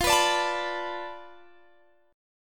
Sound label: B-Minor 7th F; Bm7/F strummed